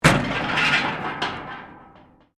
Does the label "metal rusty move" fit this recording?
Yes